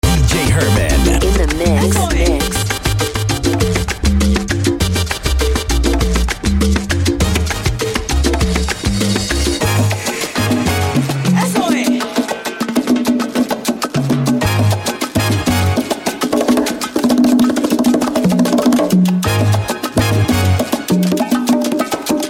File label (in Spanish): SALSA MIX